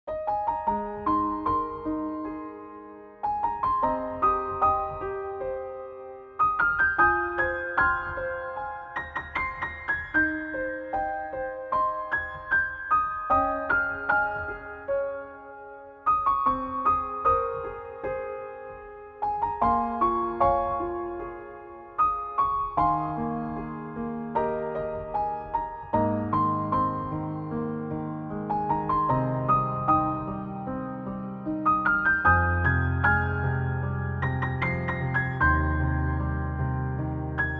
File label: Piano